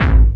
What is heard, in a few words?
bombo, kicks, Hardcore